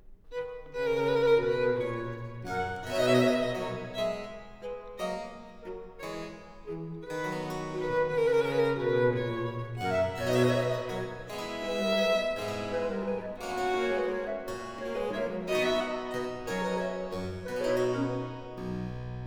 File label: flute, violin, cello & continuo; Quartet; Tafelmusik III/2; TWV 43: e2; Telemann